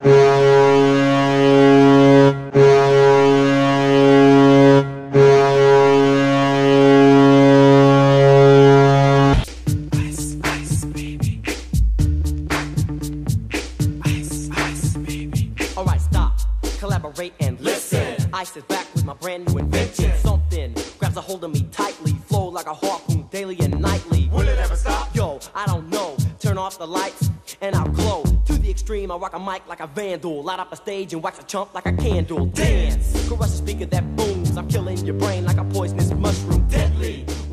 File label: goal horn; Qavaqaqqaqqaniitqeqertaq; List of MHL goal horns